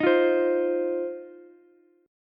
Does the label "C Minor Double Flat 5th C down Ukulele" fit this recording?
Yes